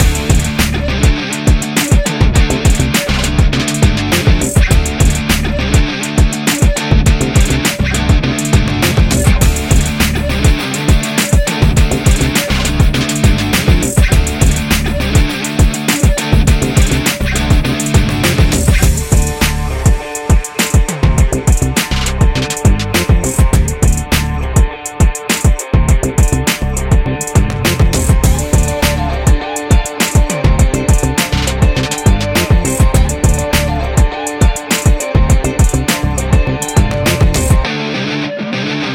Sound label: Rock Hip Hop